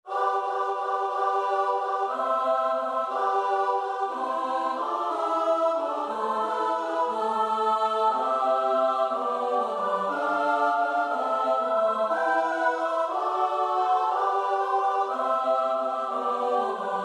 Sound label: though philomela, choir; morley